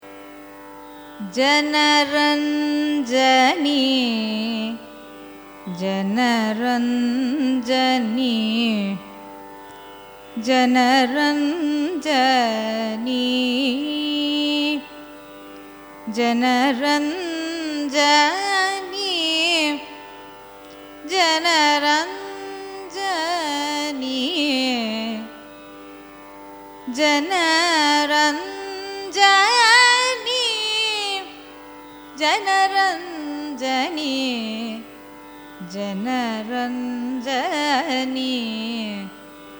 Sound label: raga janaranjani, carnatic music